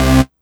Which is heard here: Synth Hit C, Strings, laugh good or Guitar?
Synth Hit C